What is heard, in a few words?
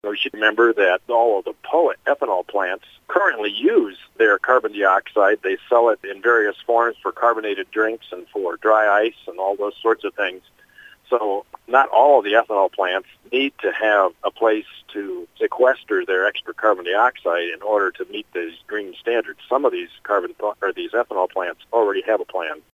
Pipes